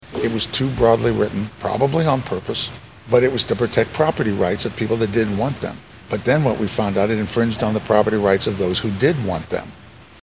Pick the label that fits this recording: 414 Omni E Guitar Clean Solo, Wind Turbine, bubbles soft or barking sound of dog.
Wind Turbine